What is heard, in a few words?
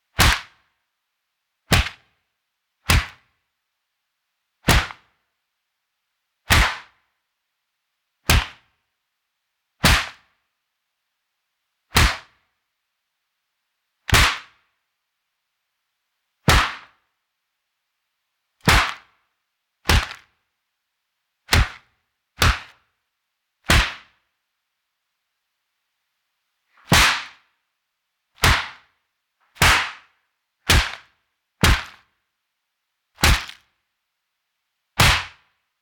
Brutal Kung Fu Punches